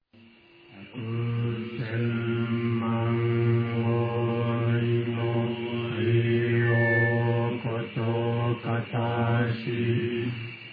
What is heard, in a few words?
chanting